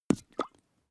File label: hot-water bag hit